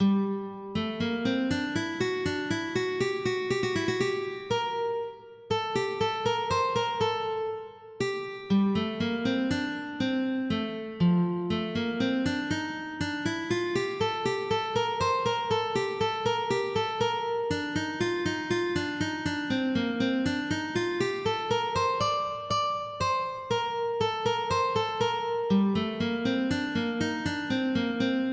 Canon for two lutes, MIDI

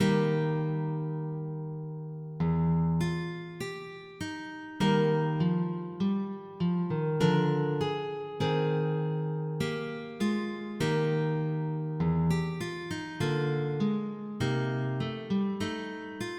Toccata

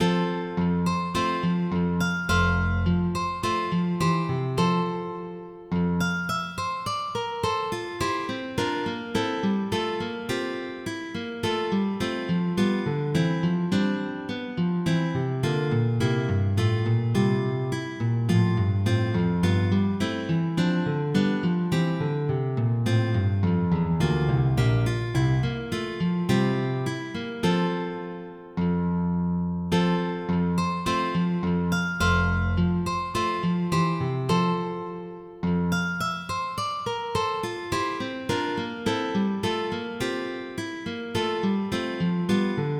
Tastegiata, MIDI

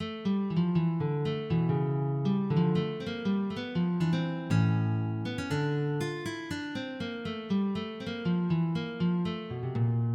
Sarabande, MIDI

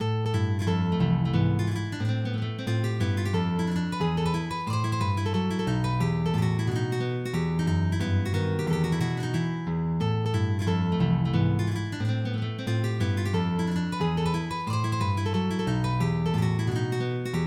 Rigaudon, MIDI

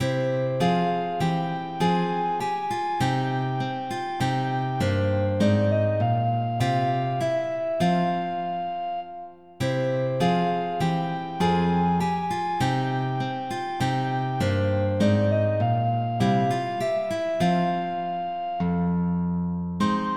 MIDI; Ma belle si ton ame

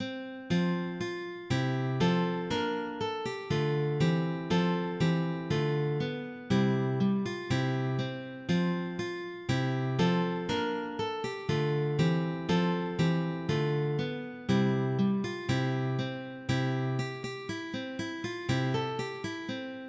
Chorea Anglica, MIDI

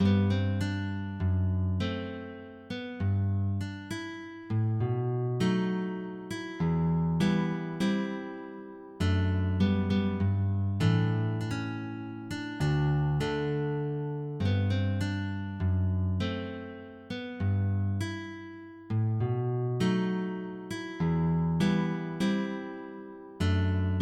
Sarabande, MIDI

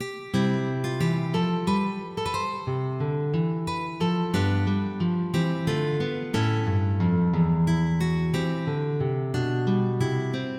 Courante, MIDI; Balardz

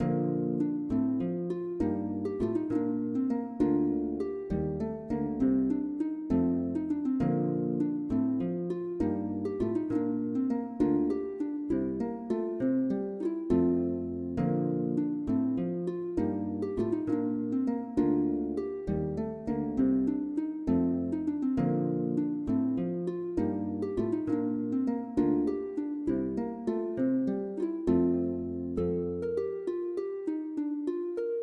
Canaries

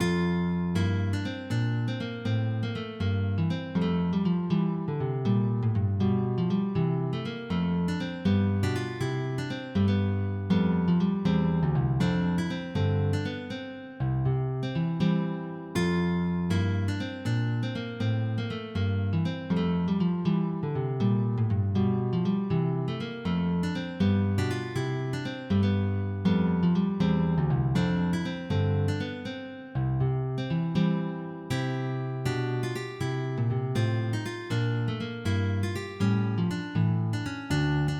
Gigue

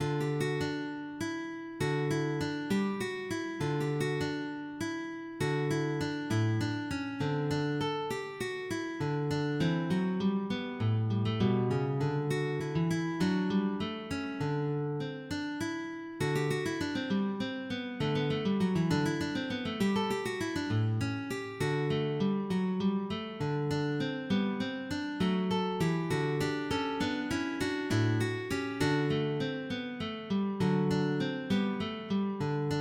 Canario diferencias, MIDI

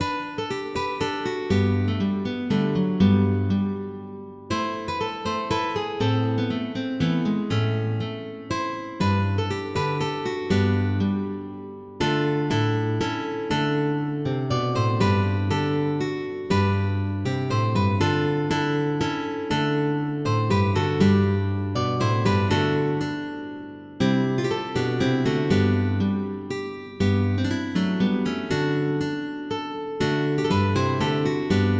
Galliard, MIDI; Mrs Elizabeth Murcots Delight

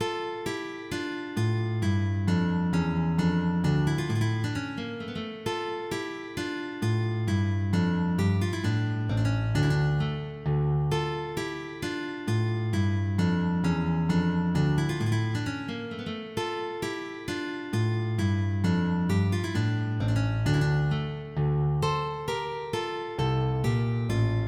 Menuet

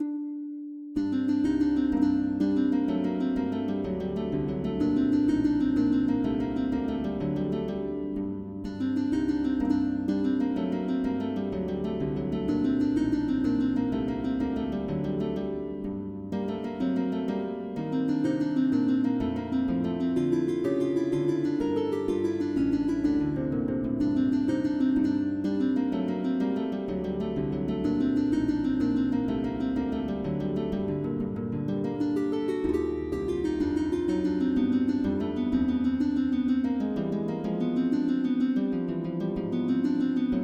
Gavotte en Rondeau, MIDI

